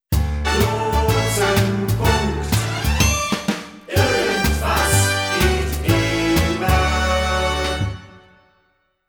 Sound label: jingle vocals short